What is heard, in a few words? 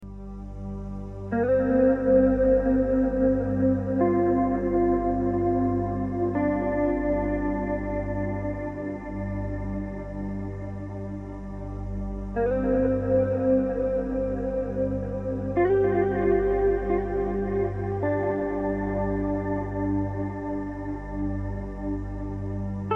Tranquil Music For Relaxing Spa